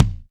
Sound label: ELEC.DRUMS; Crypton - DrumBase5000